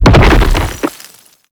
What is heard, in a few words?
Egg Mine Field Low Impact; Tau